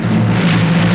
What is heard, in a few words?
drumroll; korea